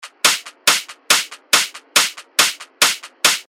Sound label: 140 BPM, techno loops; Wrigley; revealed techno top loops vol 8